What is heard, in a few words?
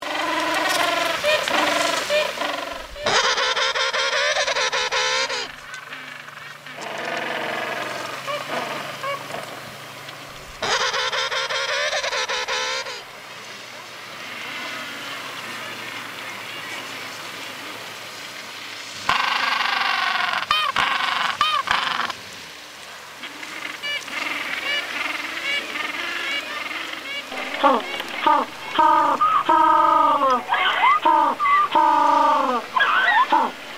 penguin sounds penguin calls; Animate Lab